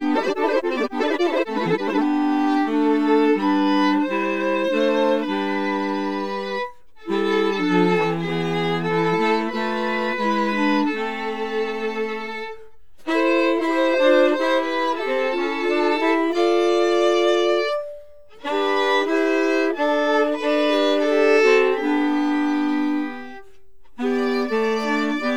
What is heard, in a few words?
Bach Chorale Scorealign resyn